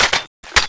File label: change, weapons; cso; vulcanus7